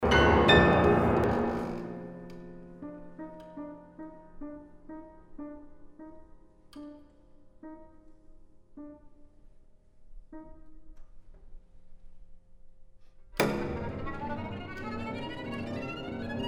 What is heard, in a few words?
PIANO TRIO; 3rd mov